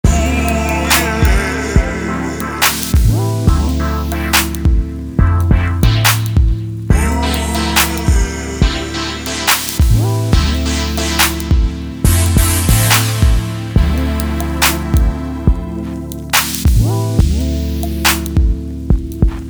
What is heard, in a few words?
T-RAP